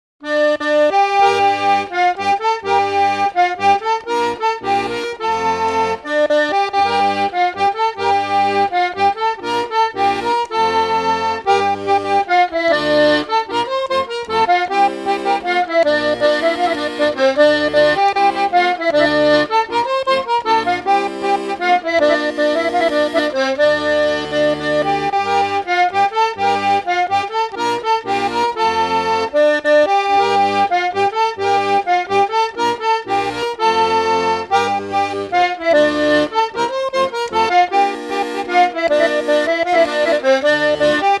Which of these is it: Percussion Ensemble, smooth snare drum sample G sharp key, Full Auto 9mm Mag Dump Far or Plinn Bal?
Plinn Bal